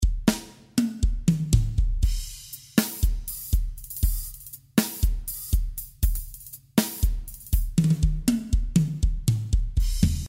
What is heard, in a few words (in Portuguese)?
BATERIA ARROCHA